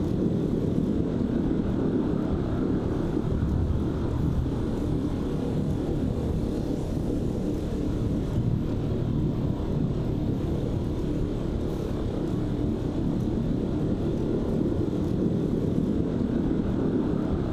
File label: ship battleship idle; synthqueen; Stellaris; machineage